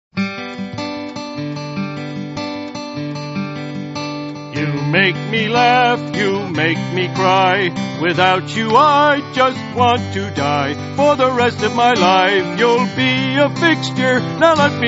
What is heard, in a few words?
you make me laugh